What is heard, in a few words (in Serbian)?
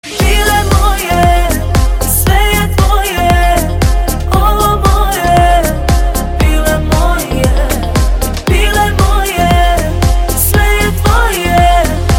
limun muzika